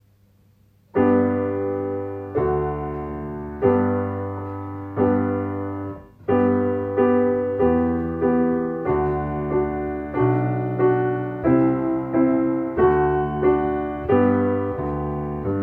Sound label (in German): Klavier